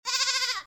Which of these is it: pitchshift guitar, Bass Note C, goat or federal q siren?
goat